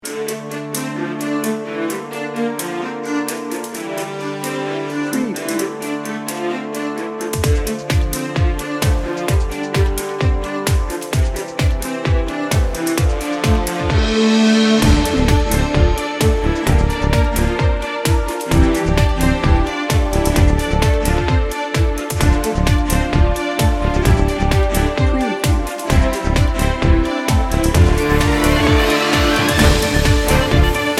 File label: heroic power